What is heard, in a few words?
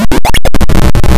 CRYSTAL SND; Keen 3